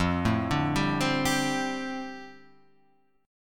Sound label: F 6th Add 9th F down, chord; F6add9 arpeggiated